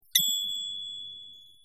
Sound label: oven done; Delicious Emilys Wonder Wedding